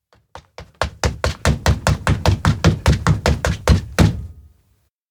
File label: steps indoor new hall run; chiharu; anemoi パッケージ版 1.1